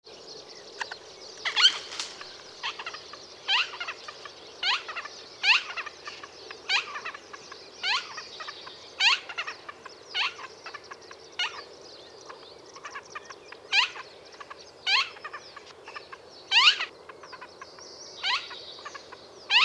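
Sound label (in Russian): bolotnyy lun golos, marsh harrier voice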